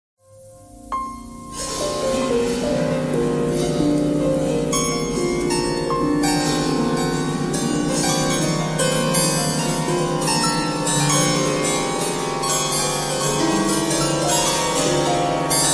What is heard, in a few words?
piano thread noise all